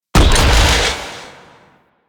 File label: Sidearm Charged Shot; Weapons; Warframe; Tenno; Wraith Sidearm